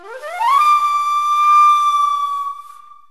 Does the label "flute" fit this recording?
Yes